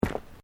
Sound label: Footsteps Boots Road Step